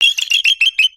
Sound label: Purr